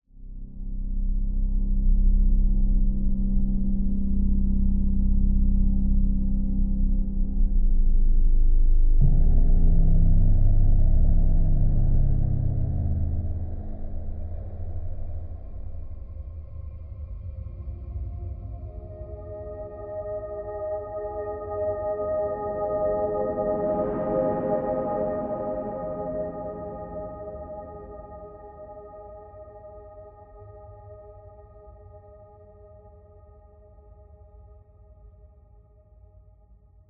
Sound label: ambient